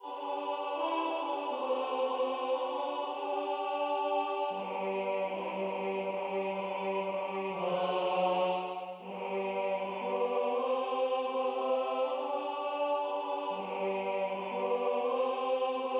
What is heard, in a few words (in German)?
Lueget vo Baerg und Tal Tenor